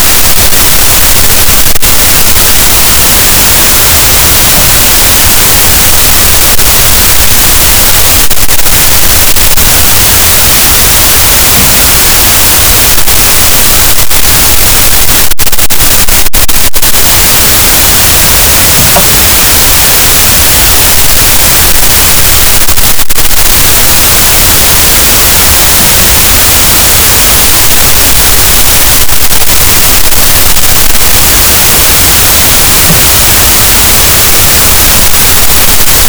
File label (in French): cachalot, Physeter Macrocephalus, gaussian noise